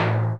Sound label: Tune, SNES; Final Fantasy 4